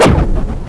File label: puu